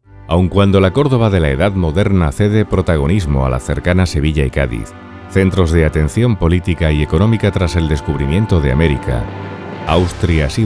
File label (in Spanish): Ruta con musica